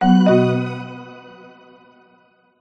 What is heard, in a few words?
alert warm synth complete; zapsplat